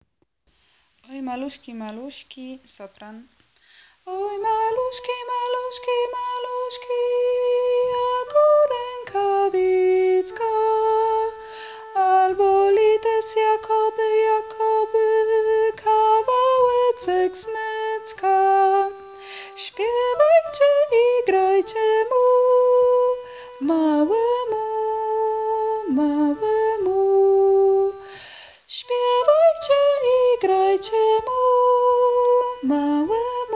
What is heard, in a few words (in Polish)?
sopran; Oj Maluski; Boze Narodzenie